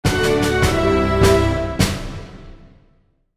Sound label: victory